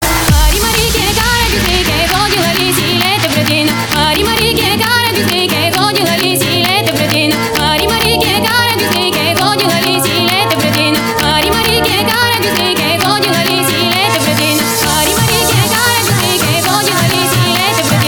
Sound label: Progressive Rhythm